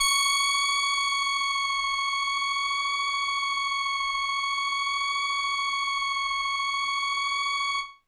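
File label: Indie Organ Kawaii C#1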